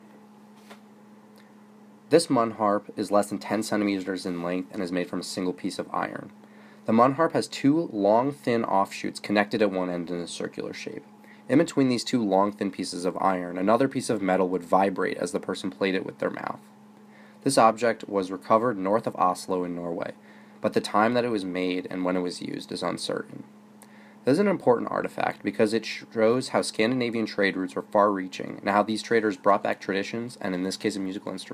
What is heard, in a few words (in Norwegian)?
Munnharpe; viking world